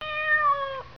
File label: cute kittens; صدای گربه